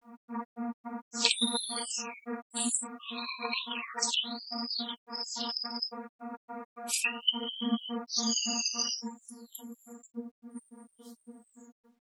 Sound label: Water